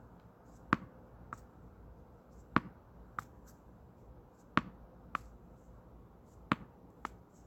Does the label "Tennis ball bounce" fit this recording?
Yes